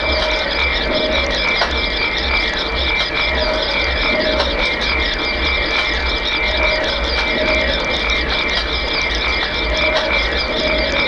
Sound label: ambience energy